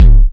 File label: Kick, dry perc; Quasimidi Rave-o-lution 309